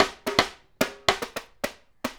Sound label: sidestick; Drums; Snare Patterns; Essential Percussion